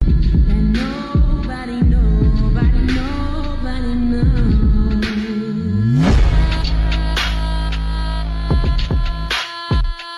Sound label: Attitude Music Ringtone